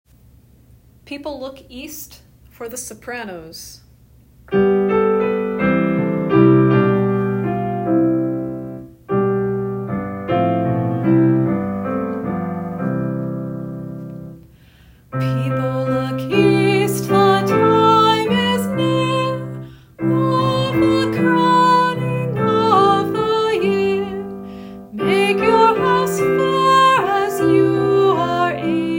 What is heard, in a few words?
People east soprano